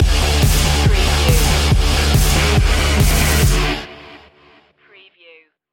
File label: smash and grab stinger